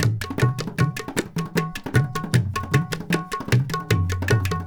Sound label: INDIA L, IND ENS; Spectrasonics - Heart of Asia CD2